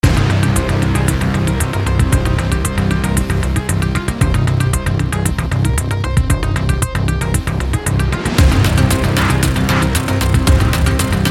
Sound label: music